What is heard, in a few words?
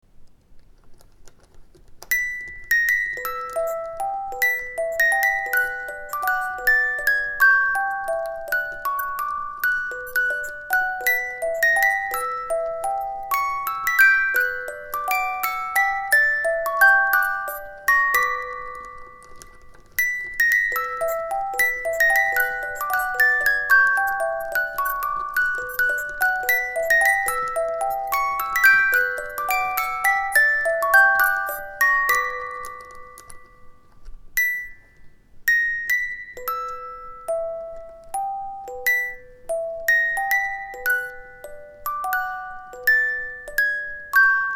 musical box